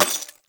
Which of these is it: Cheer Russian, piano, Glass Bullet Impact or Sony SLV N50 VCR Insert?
Glass Bullet Impact